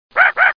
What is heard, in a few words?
Caw; dongwu